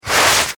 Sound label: Snowboard Slide Stop; Sports; Skiing and Snowboarding